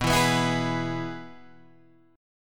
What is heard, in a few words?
B 6th Suspended 4th B, guitar chord; strummed